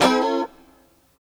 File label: B FUNK CHORDS, Guitars; Spectrasonics; Hans Zimmer Guitars VOL-1